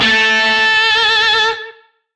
Shawzin A; Warframe; Lizzie Shawzin

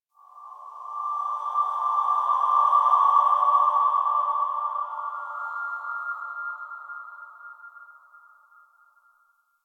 Texture One E, Ambience; Warframe; RailJack

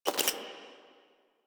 Screen Glitch

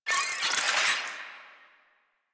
Infested Ashen Vocal Attack; Warframe

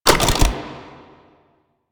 Hack Minigame Mech Action C

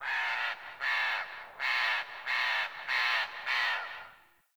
Forest Open Glade Bird Far, Day; Ambience

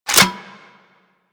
Reload Cannon Insert; Grineer

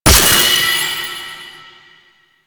Horse Racing Orb Hit; Duviri

